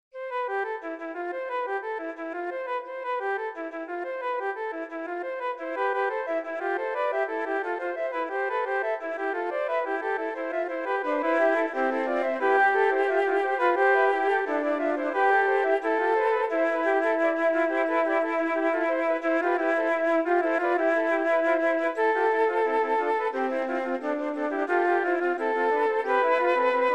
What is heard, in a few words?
3 flutes